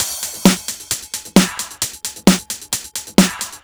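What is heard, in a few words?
132 No Bass Drum, Drum Loops; Zero-G; Soundclash Nu Dance Electro; Acidized Wavs; 132bpm; Bump n Groove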